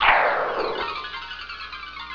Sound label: Ghost Noise; BOOM